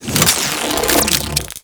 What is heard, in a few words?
Tonfa Equip; Crp Tonfa